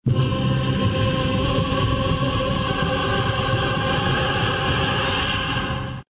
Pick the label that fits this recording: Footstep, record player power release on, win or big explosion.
win